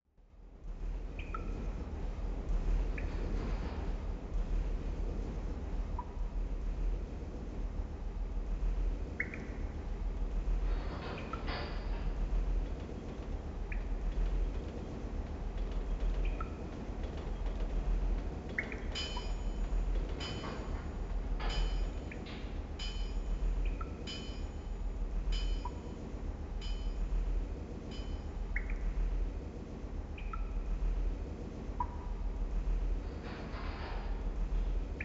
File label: Ambient Mine Caves; Star Fox Adventures